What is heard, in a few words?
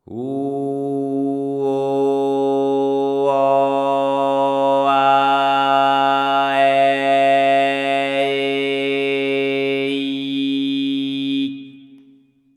Seven main vowels in harmonic order; le sette vocali in ordine armonico